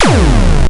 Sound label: Kzap; tempest 4000